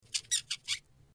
souris

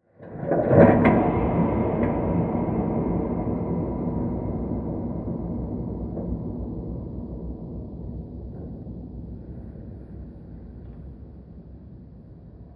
thriller douche froide

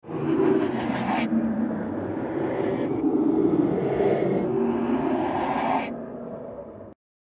rire

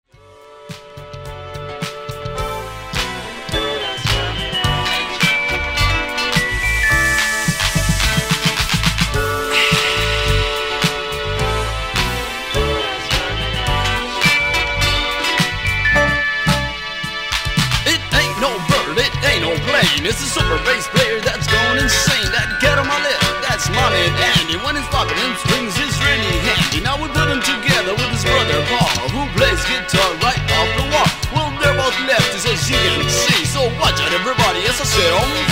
Funk Coupé

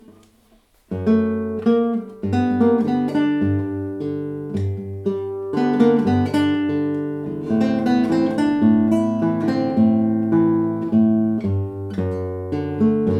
Guitare classique

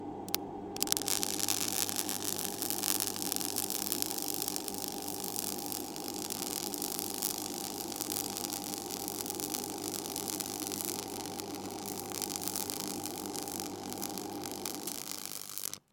Arc Longue soudure mig mag

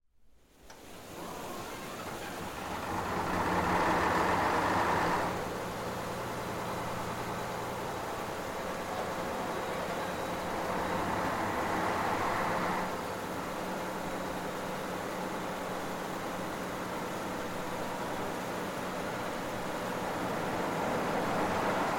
barrage interieur

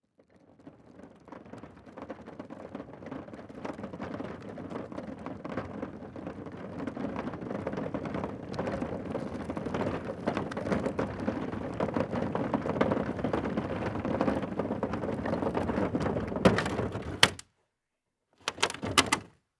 Poubelle qui roule sur route

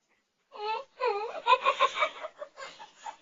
rire d enfant

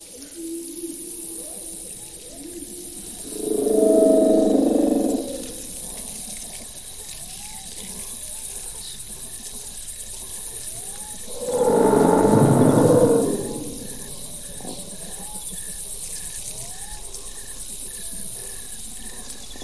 Cri de dinosaure; réalité virtuelle d Angeac

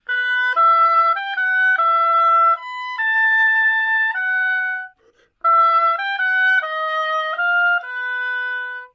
hautbois

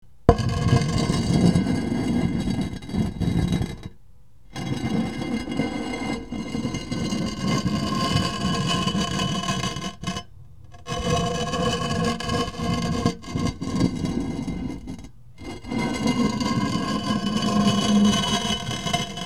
Pierre tombale ouverture

